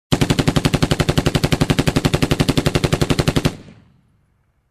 Long machine gun fire

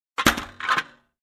Put in place

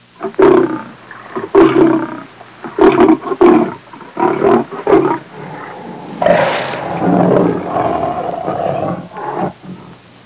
Jungle leopard sound effects

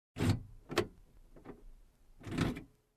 Car door locking

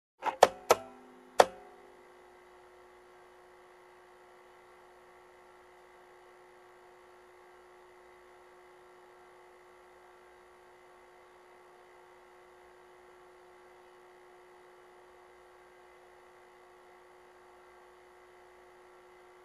Reloading film on a projector

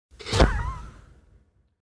Weird Drop

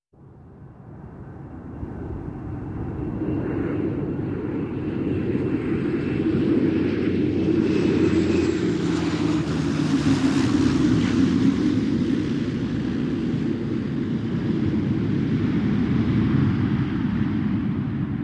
Airliner Approaching Taking Off

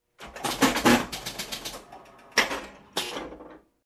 Arcade Machine maintenance

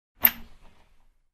Steel Door lock click

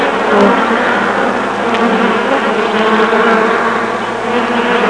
Killer bee swarm

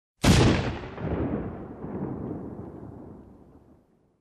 Cannon Blast

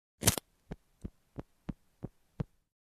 Crackling lighter switch

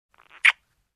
Kiss Smack sound like

